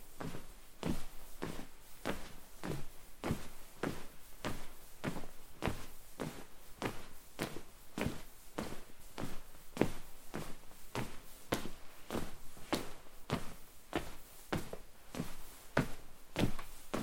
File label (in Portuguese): som de passos